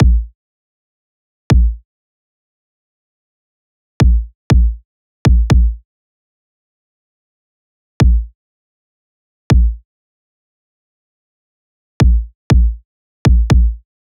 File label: kick loop 60; Pop; Drums; Loops; Slate; SD PASADENA Pop Sample Pack